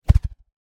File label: throwing star pass fast L R